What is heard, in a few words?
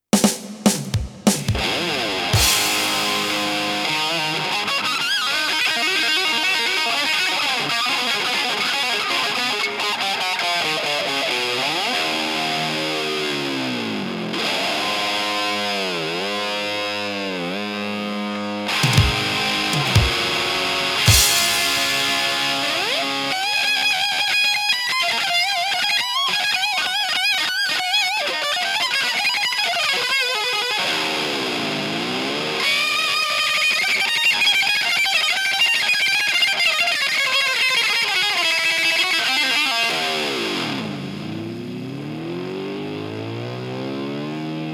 Eruption